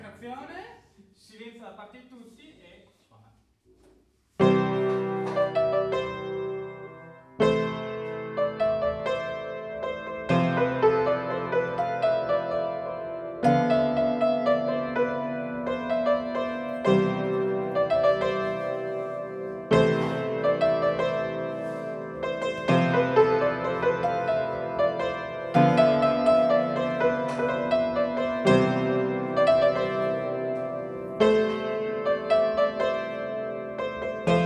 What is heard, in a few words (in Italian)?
pianoforte dietro